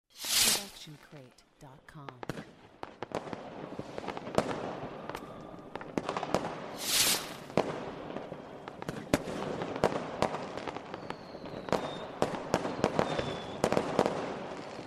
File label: fireworks; soundscrate